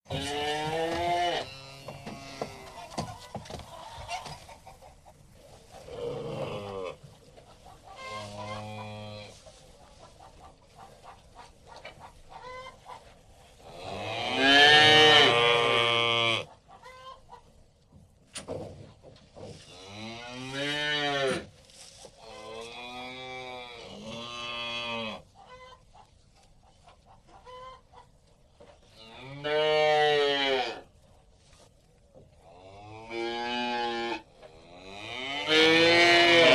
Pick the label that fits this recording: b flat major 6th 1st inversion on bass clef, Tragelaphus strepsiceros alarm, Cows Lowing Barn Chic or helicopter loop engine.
Cows Lowing Barn Chic